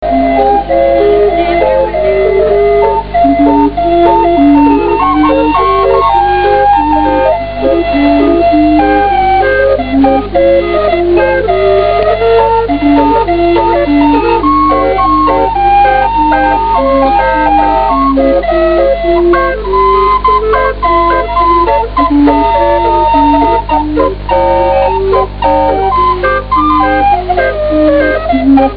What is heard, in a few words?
caliope